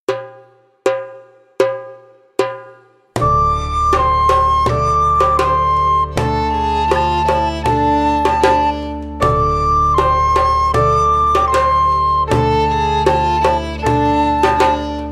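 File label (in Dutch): met blokfluit langzaam; Dromedaris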